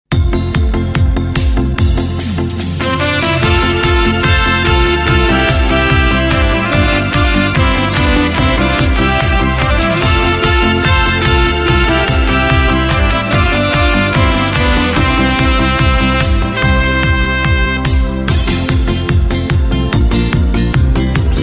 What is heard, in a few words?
Korg Pa3X